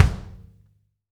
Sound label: kick, Interactive Drum Kits; Sonic Reality